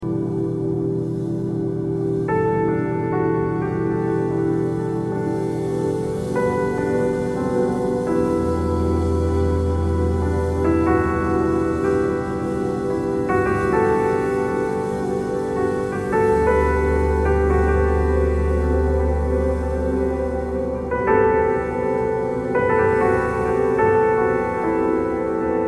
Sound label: alto